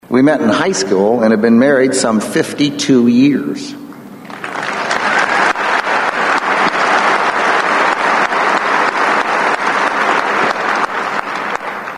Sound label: applause